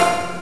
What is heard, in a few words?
Rev Orch; Urban Shakedown